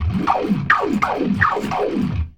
GLISS, ORGAN; Keith Emerson Signature Series

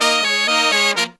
Pop & Funk Brass, 120

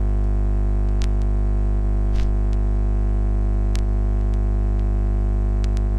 Tape Noise; noise hiss crackle

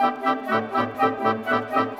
Winds, Orchestral

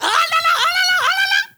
FEMALE RAVE; Spectrasonics; Heart of Africa

